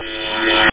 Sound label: PLASMA; amiga